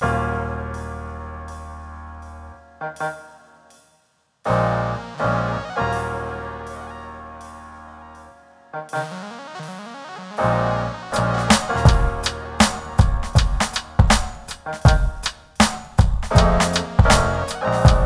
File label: lofi